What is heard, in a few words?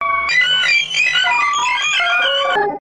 Robot Computing